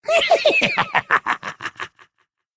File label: attack; Mad Mage; Mob Voice